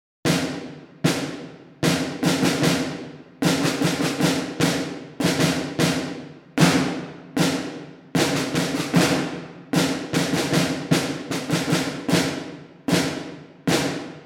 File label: military drum groove